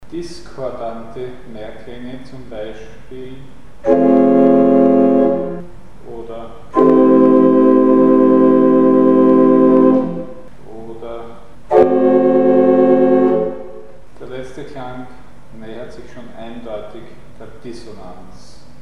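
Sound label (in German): Diskordante Zusammenklänge; Mikrobuch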